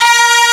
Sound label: FAIRLIGHT CMI IIX, STRINGS